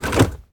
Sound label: lever; Golden Crayon Sounds